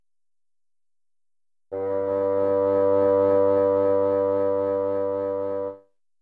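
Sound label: Bassoon; G2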